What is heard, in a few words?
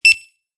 store scanner beep; QR Reader From Android